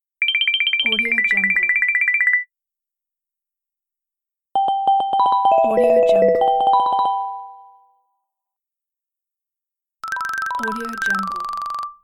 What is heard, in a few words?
cellphone dinging